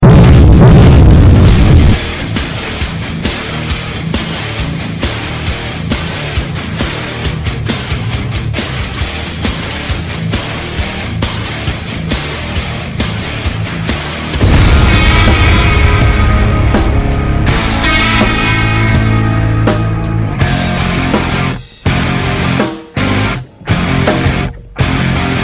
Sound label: explosion mix, rock; ringtones